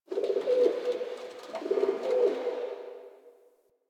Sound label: Gate Bird; Ambience; One Offs; Ostron; Warframe; Eidolon Plains